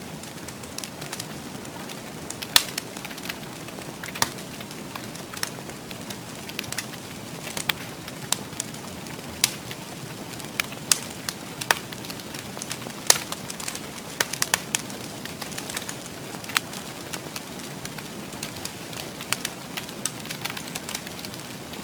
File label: fire, ambient